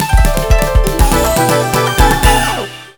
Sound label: TRACK START DANGER; maimai